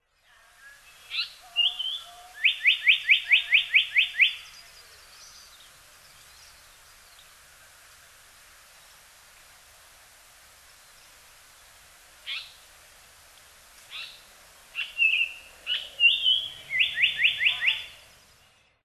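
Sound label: Japanese Paradise Flycatcher; hyogo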